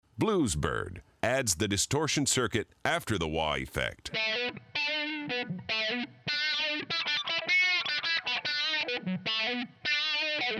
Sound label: Blues